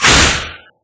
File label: shoot, wither; mob; Minecraft